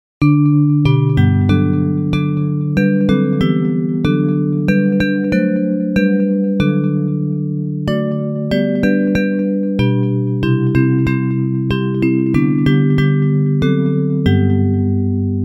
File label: Bells